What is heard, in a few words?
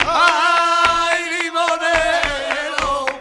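CLAP + VOCAL, MCLAPS+VOX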